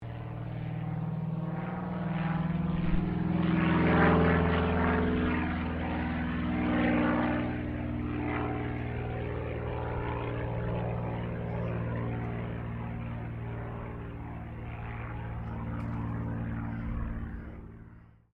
AIRCRAFT CAC CA-25 WINJEEL PASS DISTANT, fighter trainer; RAAF Winjeel; Airplanes